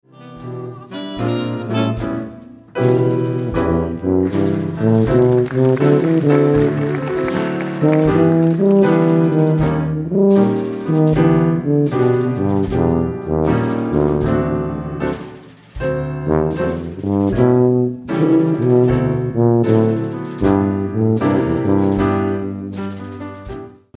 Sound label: Short Solo